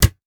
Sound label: 2 layers 2 percussions